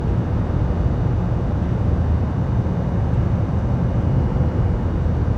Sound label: office garage tone; ambient; tones; Counter-Strike Global Offensive